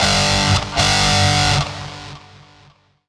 Guit; GUITAR; 110 BPM

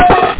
payout

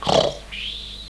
wolf; Little Red Riding Hood

